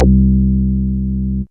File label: WET BASS, OLD SYNTHS; Rubber Chicken Software Sample Bank